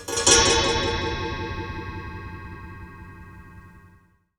Delayed, Wire & Tin, contact mic; musicradar; contact mic madness

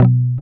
GUI B, ACOUSTIC

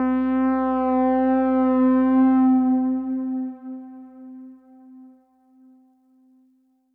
Pad C, Synth Prophet One Shots; Electronisounds

